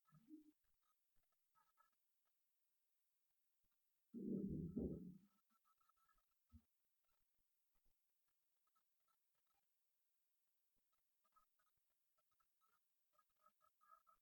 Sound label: empty bowling alley